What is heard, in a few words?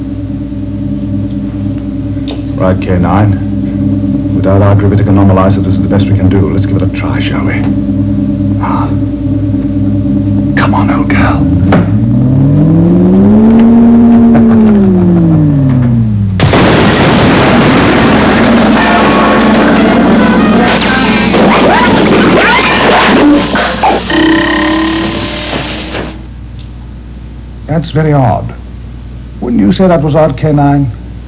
boing!, tardis